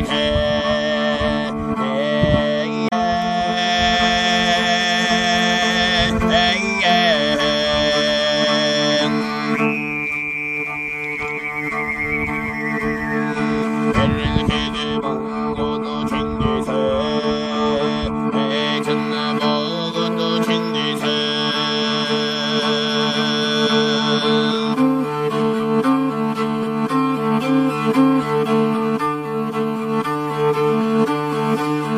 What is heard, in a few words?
Mongolian Throat Singing